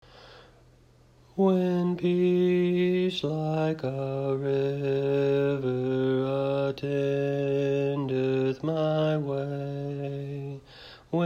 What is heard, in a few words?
Alto; It Is Well With My Soul